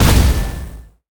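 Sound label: Mech Gorilla explosion; monster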